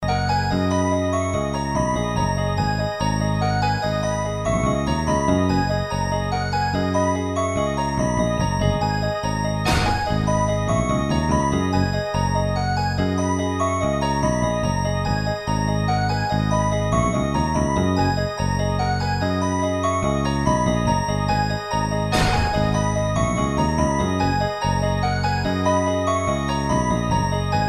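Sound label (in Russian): kolokola i muzika, bells and music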